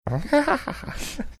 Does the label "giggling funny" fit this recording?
Yes